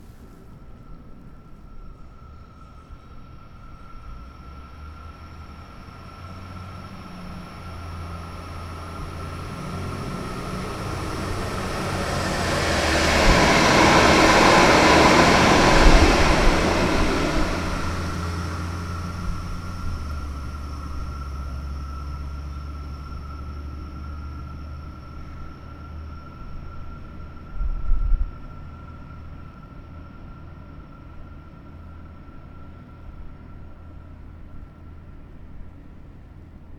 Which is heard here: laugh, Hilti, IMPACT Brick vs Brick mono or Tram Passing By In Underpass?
Tram Passing By In Underpass